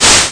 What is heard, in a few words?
boom, cartoon sfx